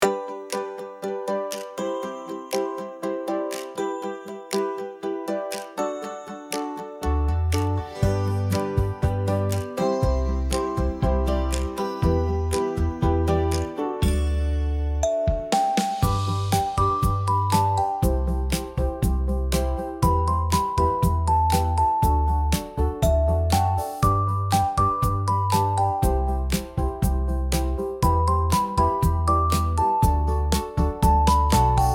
Playful cheerful

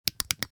Electronic military device button press; Military Equipment

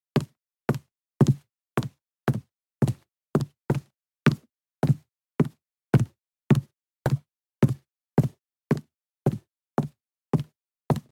High heels fast walking on street

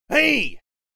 Male shout for attention; scream

